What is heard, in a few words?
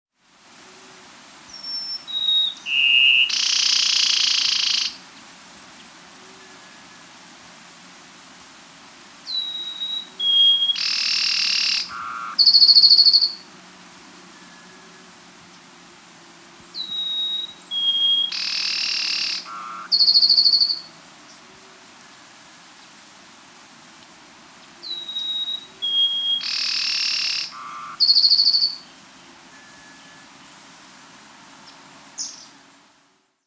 Chestnut-Breasted Wren (Cyphorhinus Thoracicus), Andean Birds; S. America